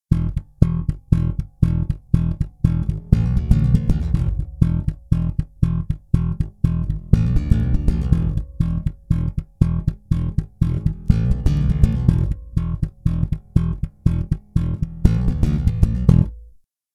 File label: Slap, pbhumbucker